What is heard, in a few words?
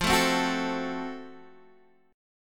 Gb Minor Major 7th Flat 5th F, guitar